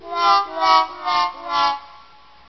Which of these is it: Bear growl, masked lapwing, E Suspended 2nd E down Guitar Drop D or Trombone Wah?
Trombone Wah